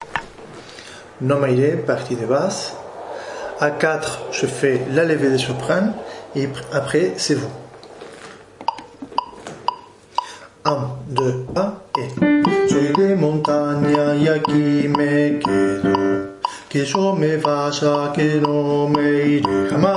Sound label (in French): Basse